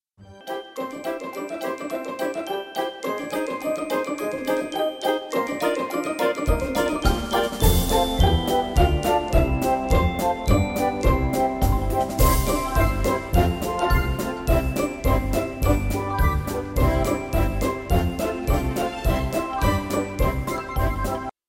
Zima zima zima pada bialy snieg wersja instrumentalna

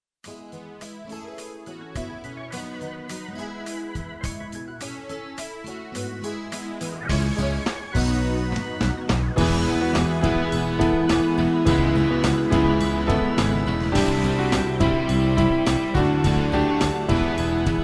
wersja instrumentalna

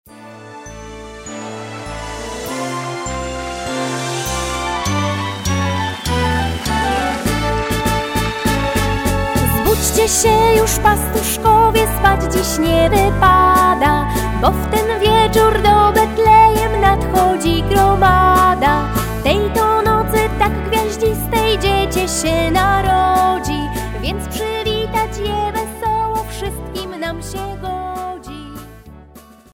wersja wokalna

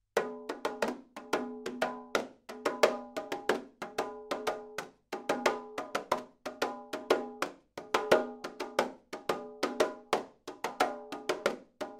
Bebenek reczny; NINO

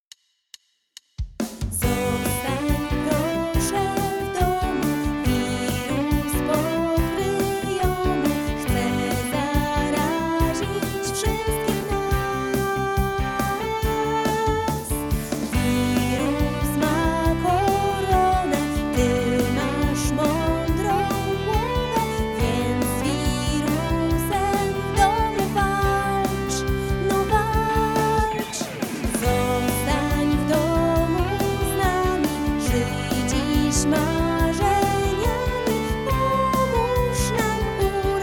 Koronawirus z voc

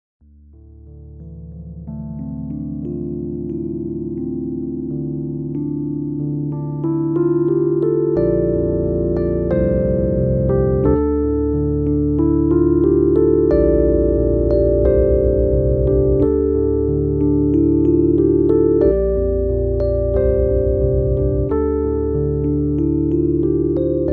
Relaksacja jesienna

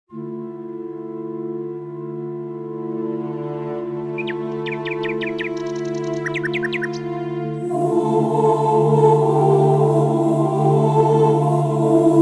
wersja instrumentalna

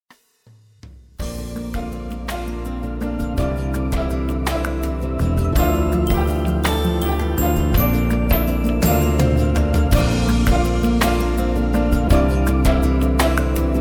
wersja instrumentalna

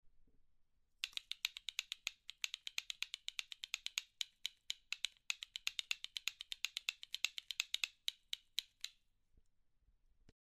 Kastaniety zwierzatka; GOKI

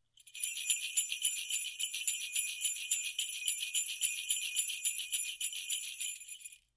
janczary

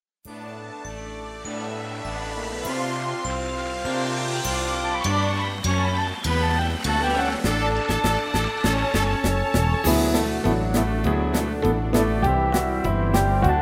Zbudzcie sie juz, pastuszkowie wersja instrumentalna

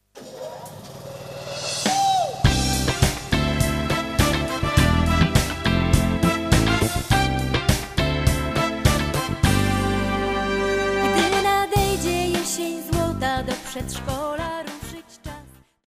W przedszkolu ukochanym wokalna

